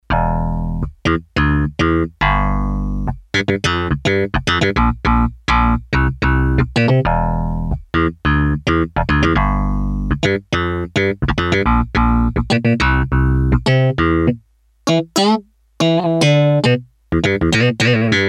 Slap Bass, Korg DS-8; Factory Patches

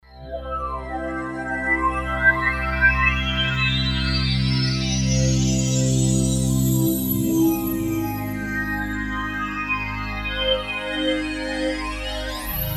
Wash, Roland JD-990; Andromida Wash; Vintage Board

